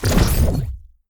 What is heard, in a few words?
water magic